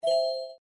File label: win; cryptos